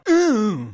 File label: thwomp